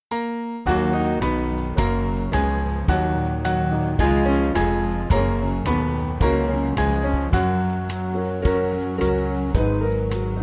Band

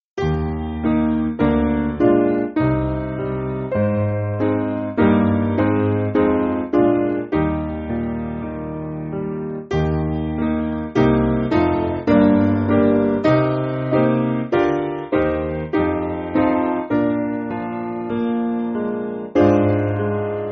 Piano

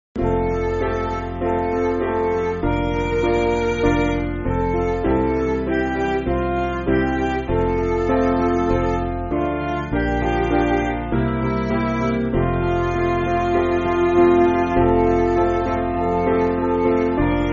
Midi

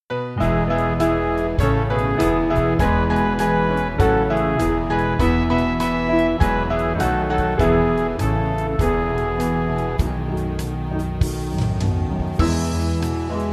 We See The Lord, Descant, Band; Small Band; 2/E-F With Descant

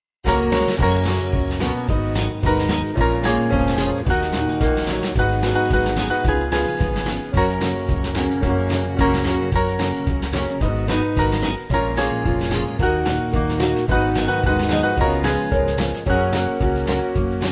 Band, Music Backing Files for Churches; It's Me It's Me; African American; Spiritual

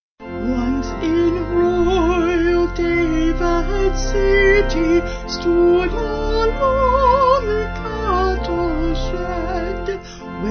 Vocals Organ; Once In Royal; Irby

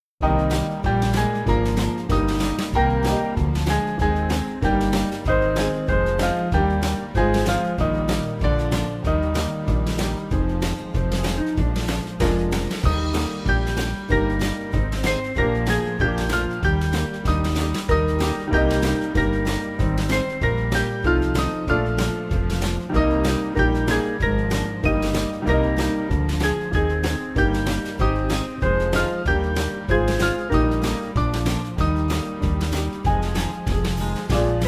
Band; Shipston

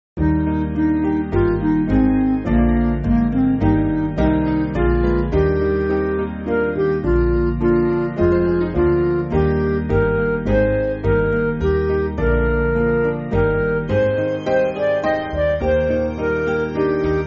Midi; Be Thou My Vision; Slane

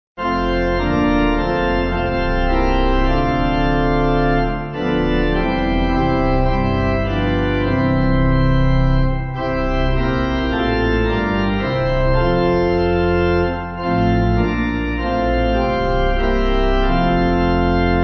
Pipe

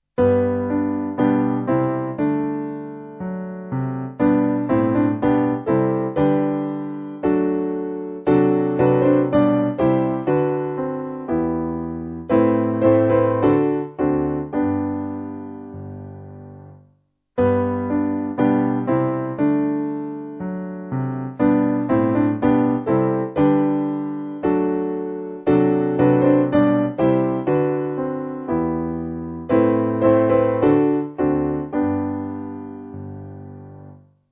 Let There Be Light Concord SPiano

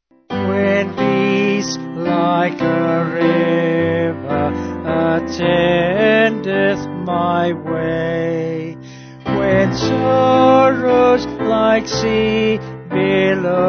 Vocals Piano

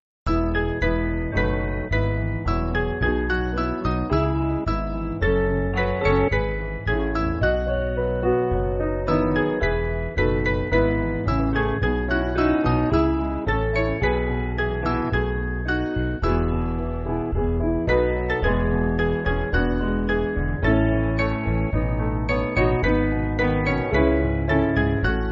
Thou Didst Leave Piano

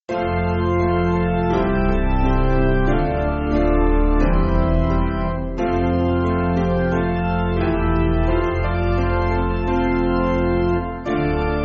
Midnight Sleeping, Chinese, piano

